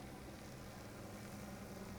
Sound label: pulses, BALAENOPTERA; MONACO 2022-07